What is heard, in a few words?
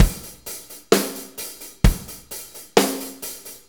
4-4 Loop, Drum Loops, Full Kit Loops